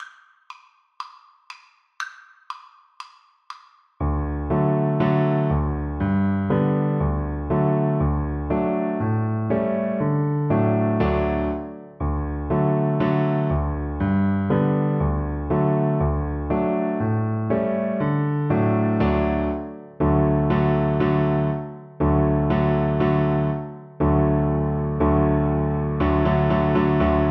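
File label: viola karaoke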